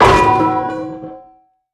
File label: Crash Objects Large Metal; gamerip; Car Simulator 2; android